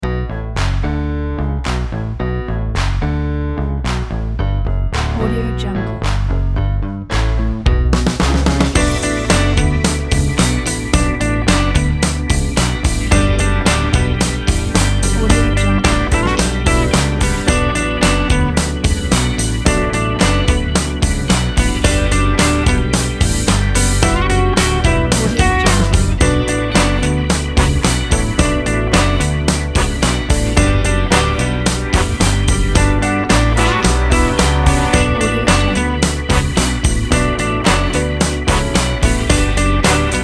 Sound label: vintage neo soul upbeat